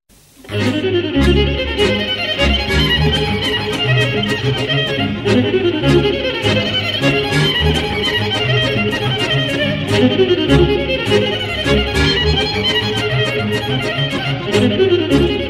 Sirba Munteneasca, Roumanie

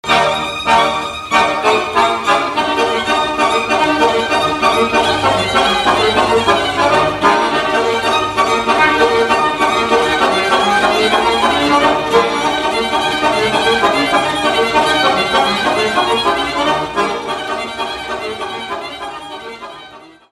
Sirba, Roumanie; Sirba Sita Buzaului

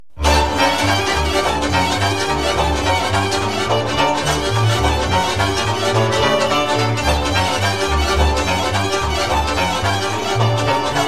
Sirba de la Bustuchin, Roumanie

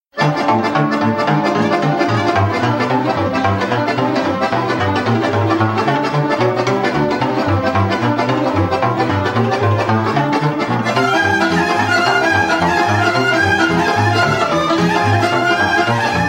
Suita olteneasca - Roumanie

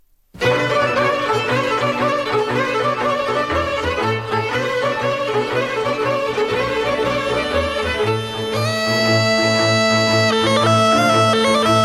Rustem Oltenesc, Roumanie

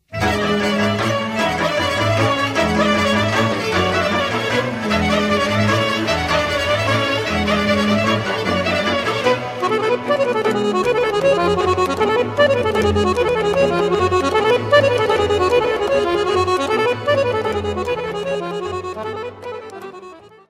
Rustem Oltenesc, Roumanie

